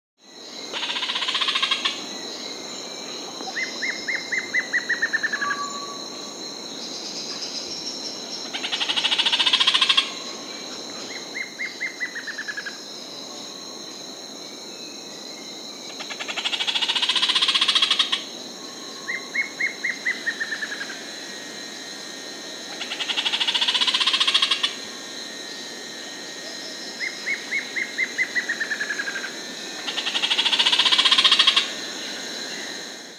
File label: Bolivian Recurvebill, Simoxenops, Nature's Songs, S. America